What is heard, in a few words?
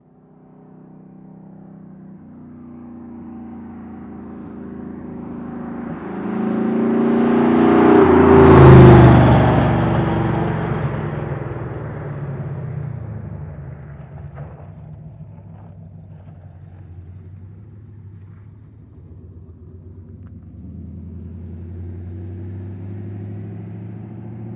Muscle Car By Idle Off; Vehicles